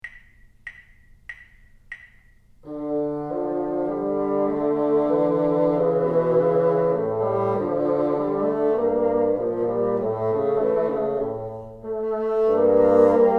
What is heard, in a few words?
together, bassoon